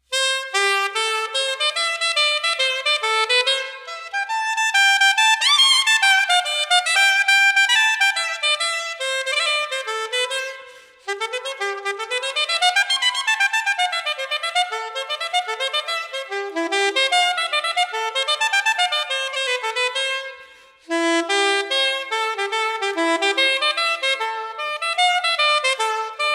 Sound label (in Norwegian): sopraninosaxofon solo; 3. sats fra Suite for sopraninosaxofon solo